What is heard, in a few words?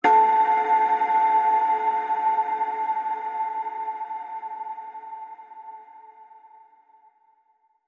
Hushed Gentle Ping